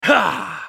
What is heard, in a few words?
effort; Vladimir; Character Voices - English; en US